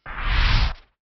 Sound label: Missile; KKND